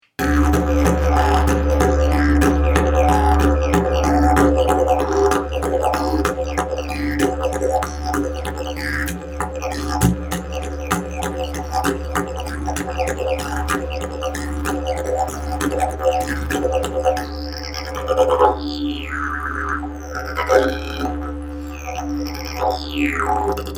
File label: didge